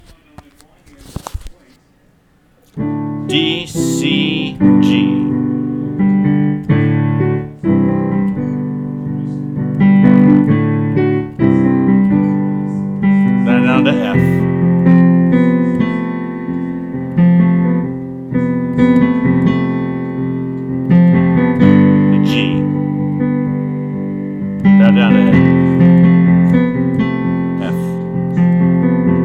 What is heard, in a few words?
Piano Thang